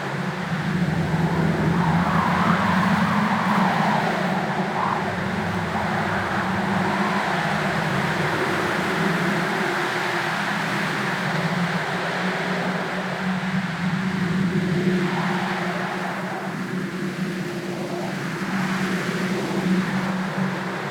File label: Settlement Crevice Ambience; Warframe; Grineer Settlement; Interior